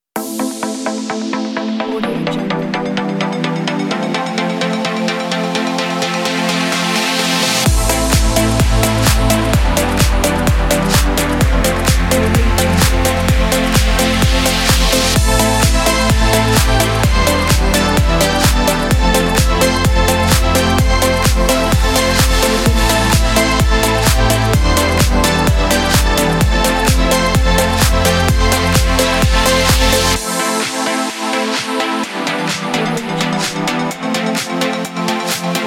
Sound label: electronics